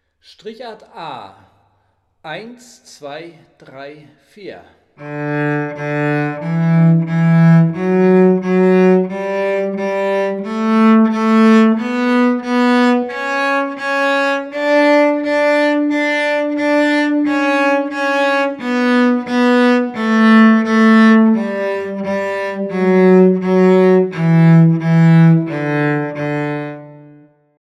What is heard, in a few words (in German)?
Tonleiter Strichart